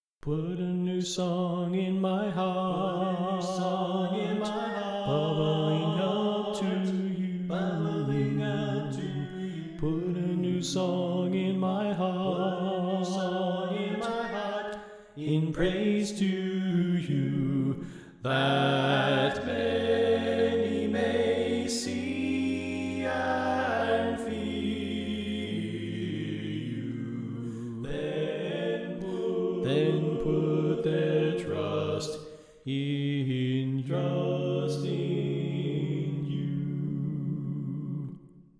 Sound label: Bass Left